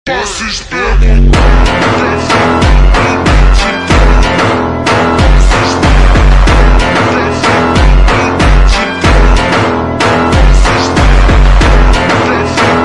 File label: english bgm ringtone; sigma male